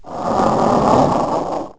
moan

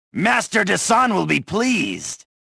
victory; reborn

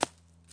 golf ball hit rough deep; ProStroke Golf World Tour 2007

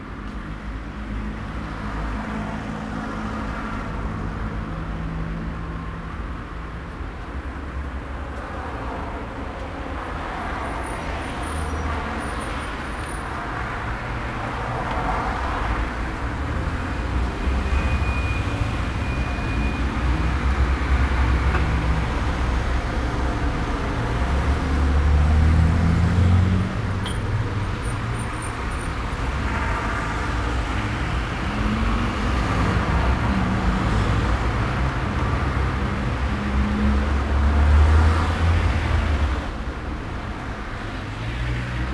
ambience; Street Hoops; XBOX

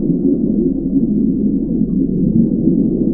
Underwater Loop; Characters; Ranger

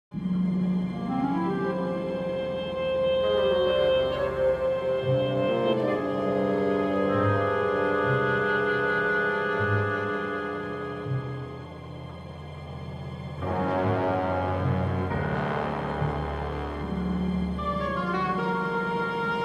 Ambient; Character Indy; XBOX

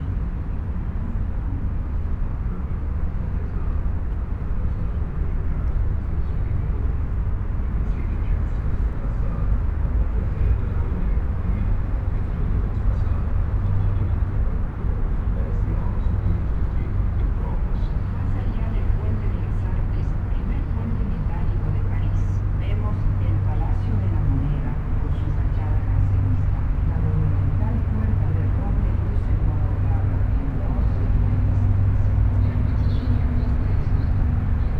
Paris River Tour, Ambience; Project Gotham Racing 2

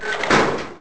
Spikes; envrn; Sotha; The Elder Scrolls III Morrowind GOTY; XBOX

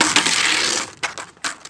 board bail; skater; Tony Hawk's Project 8; XBOX

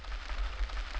torch; Pirates Of The Caribbean

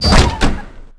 van door close; skate shop; Tony Hawk's Project 8; XBOX

